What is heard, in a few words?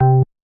bass, programmable speaker